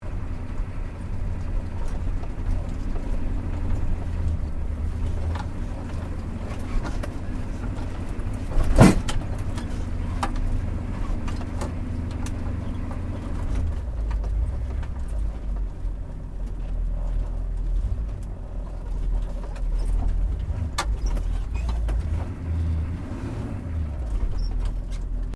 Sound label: 4WD HUMVEE MANOUVER SLOW INTERIOR, US Airforce model Humvee; Trucks; Vehicles; SoundEffects-Foundation1